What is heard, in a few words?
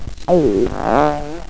fart